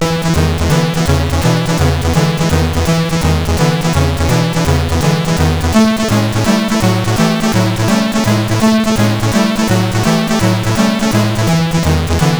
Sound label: Rock n Roll, sid CSG8580R5